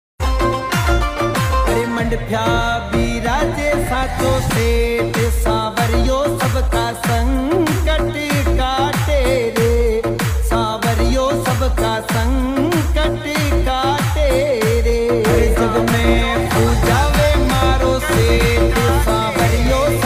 bhajan

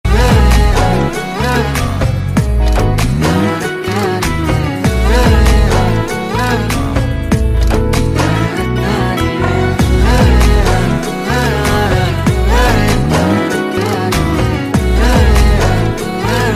romantic song bgm ringtone telugu; devara; ntr

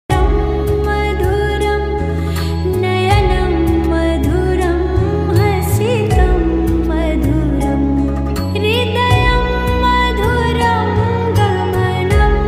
adharam madhuram lofi song

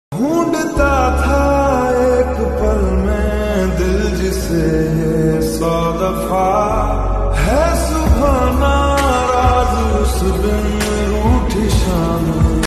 mood off song broken heart

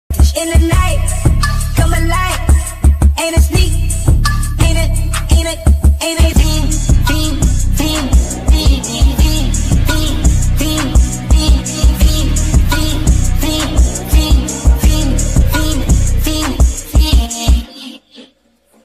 sped up ringtone

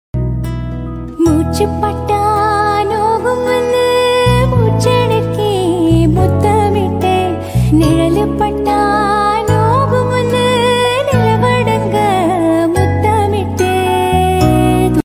song tamil

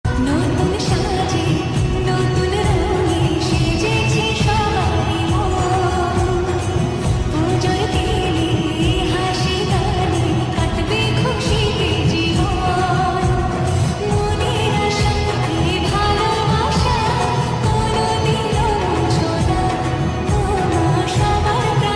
durga puja agomoni bengali song; Durga puja agomoni status

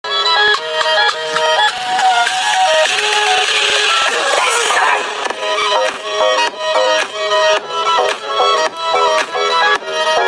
fairytale bgm; rayan

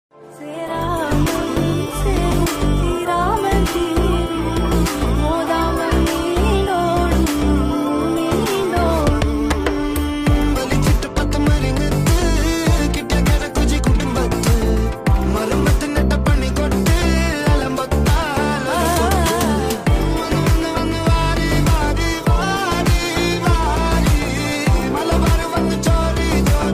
bass boosted remix song lofi mix

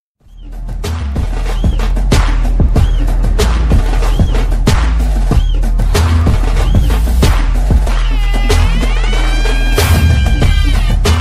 bgm ringtone

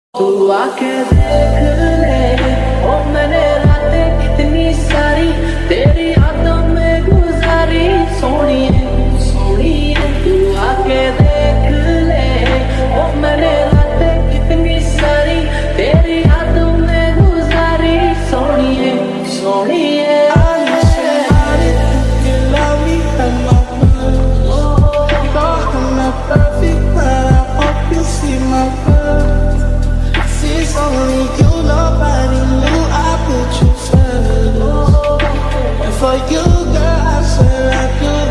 lofi remix ringtone

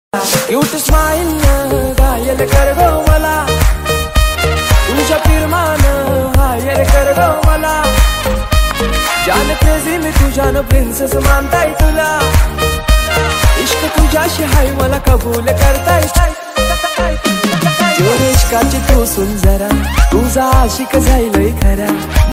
song marathi ringtone

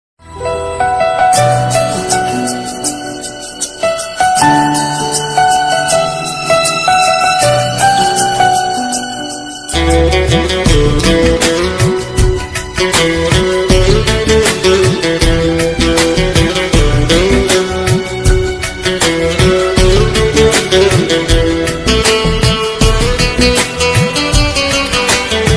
instrumental music

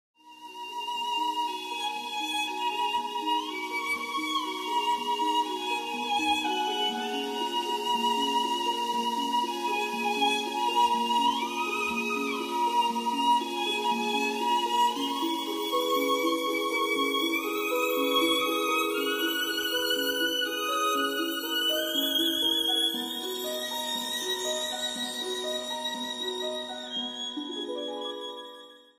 ringtone violin music

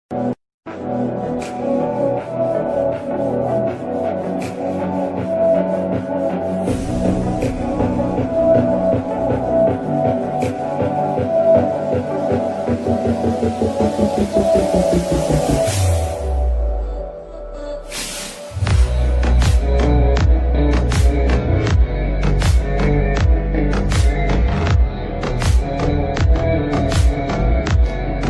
attitude song background music

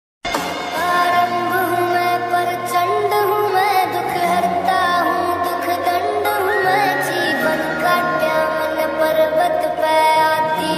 bholenath ringtone; mahakal

